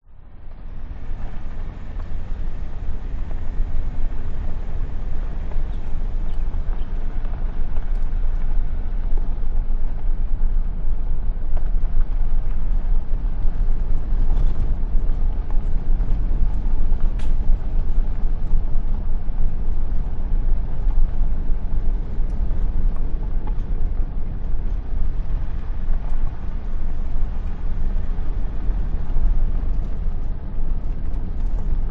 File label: transport car suv drive off road gravel track internal